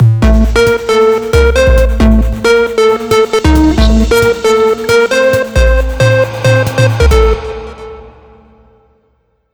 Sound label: Trap Raid